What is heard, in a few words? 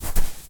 Footsteps footstep sand run; SFX Environment ProSoundCollection v1 3; Poppy Playtime